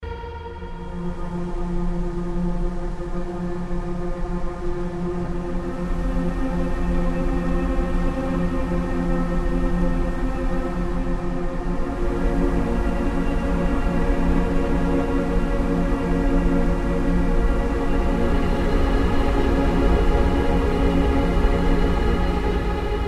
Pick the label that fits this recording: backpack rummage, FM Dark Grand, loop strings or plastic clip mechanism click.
loop strings